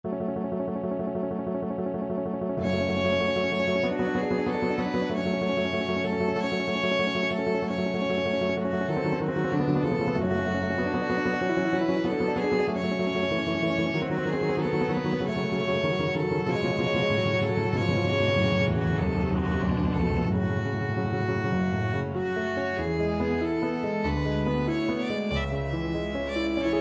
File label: scene finale, piano quartet